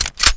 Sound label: coilgun chamber